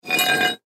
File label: Crowbar Pick Up Scrape Cement; Hand Tool; Industry; Tools; Blastwave